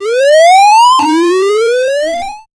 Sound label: short punchy 16, jump